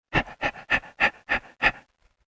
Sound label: Dog, Animal Impersonation